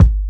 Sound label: bassy kick one shot g sharp key, kick single hit tuned to the G# note